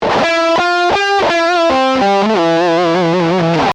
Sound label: Lick, guitar